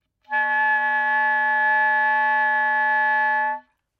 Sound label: noise